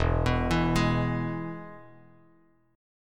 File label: E-Major E, Bass Standard; arpeggiated; JGuitar